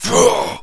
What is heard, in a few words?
zombie carrier attack